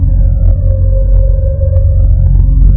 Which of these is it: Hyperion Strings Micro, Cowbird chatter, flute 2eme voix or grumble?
grumble